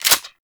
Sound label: Shotgun Pump Mech, Weapons; Warframe; Corpus